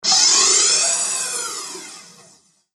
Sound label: Rocket In A Tunnel; Spacecraft Sound Effects